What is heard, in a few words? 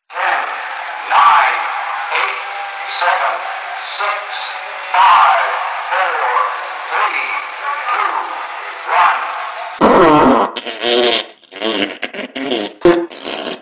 Fart Launch